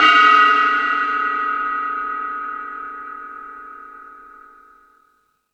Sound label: Thundadome, E-MU Pro-Cussion